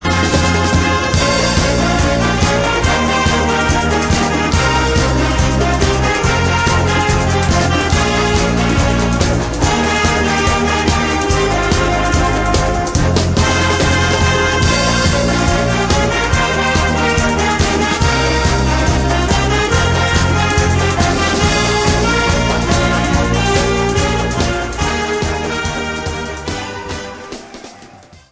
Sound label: Disco stereo